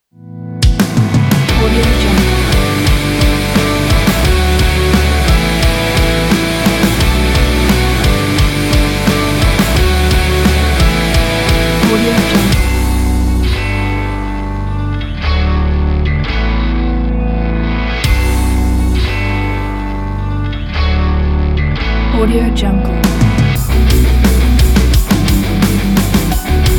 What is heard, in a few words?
uplifting love emo rock